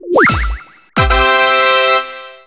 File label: SE RESULT RATING CHANGE; maimai